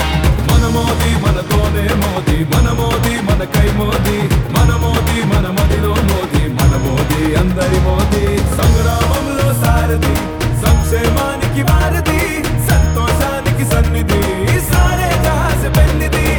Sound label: song